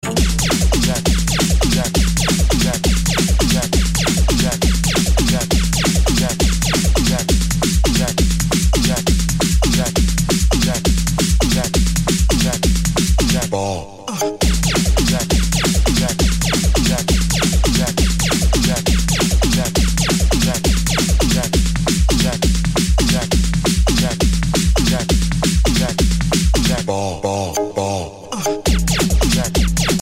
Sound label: Techno